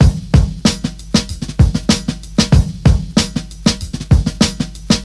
95 bpm breakbeat sample e key, kick tuned to the E note